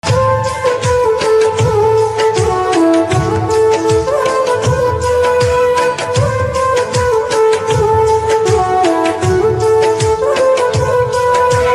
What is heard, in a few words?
Organ Instrumental Ringtone